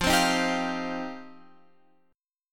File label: F 7th Suspended 2nd F down Guitar Open F; F7sus2; strummed